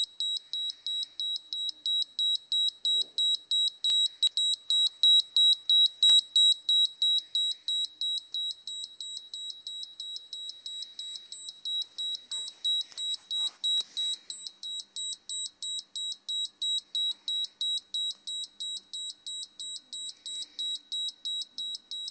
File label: beep beep beep beep beep beep beep beep beep beep beep beep